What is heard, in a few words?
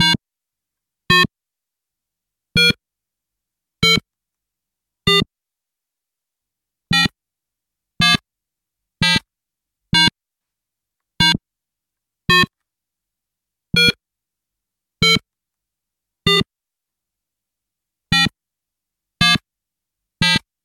ramble